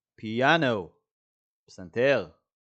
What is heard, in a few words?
piano – פסנתר